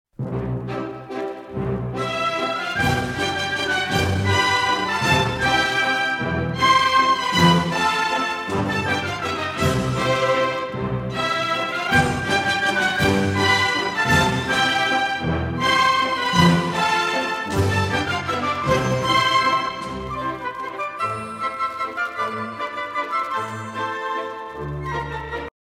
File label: Don Quixote Male Variation